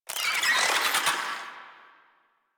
Infested Ashen Vocal Attack Death; Warframe

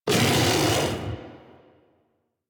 Kubrow Armored Vocal Attack Medium; Warframe

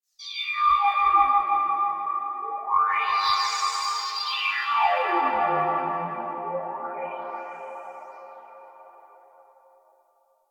Rift One Off; Warframe; Magician

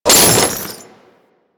Chains Beam; Warframe; Warframes; Priest

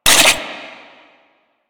flying robot engine stop; Warframe; Veilbreaker; Quests; Ambience; Kahl

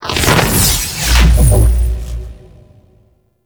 Weave Boss Crystal Summon; Warframe; Cephalon Weave

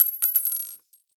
AK47 Shell Casing; Warframe; Tenno; Weapons

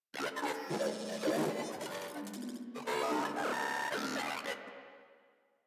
Moa Vocal Idle; Warframe

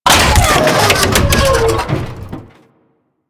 Thumper Bot Death A; Warframe; Grineer

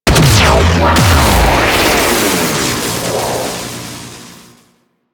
Artillery Fire; Enemies; Abilities; Warframe; Teralyst; Bigalyst

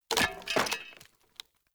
Barrel Wood Hollow Medium Destroy Chunk; Objects

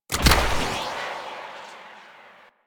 Skiff Engine Boost Slow Stop; Vehicles; Warframe; Grineer Skiff Player Vehicle

